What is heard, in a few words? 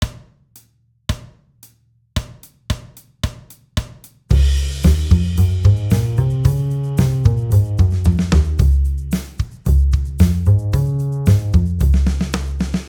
Scales Concert Db